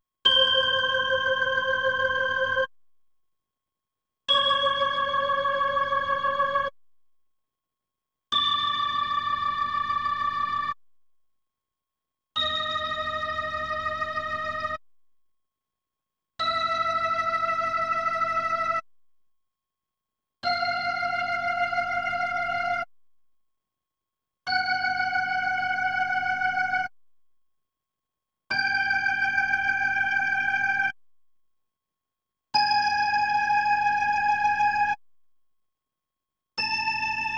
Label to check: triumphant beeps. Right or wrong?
Wrong